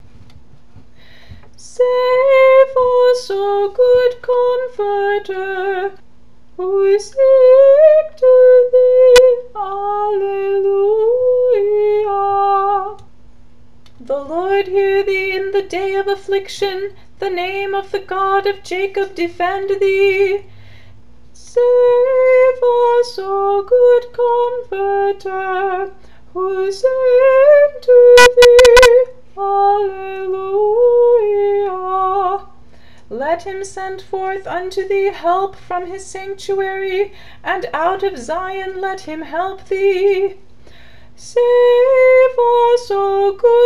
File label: 2nd Antiphon; Pentecost